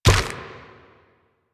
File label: Proto Infested Runner Step Default